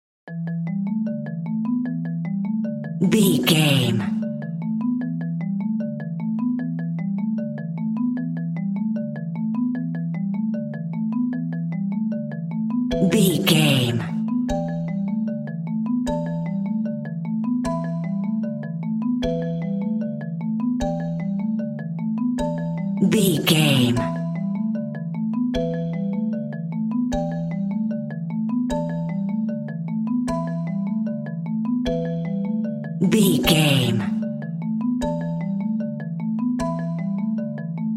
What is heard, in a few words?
warm simple marimba and kalimba